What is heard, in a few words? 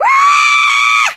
Scream Female